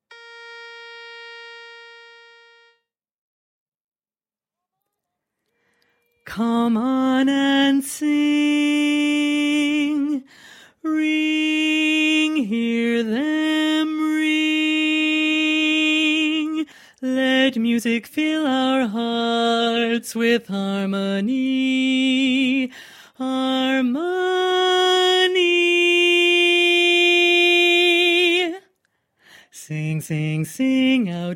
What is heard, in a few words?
bass track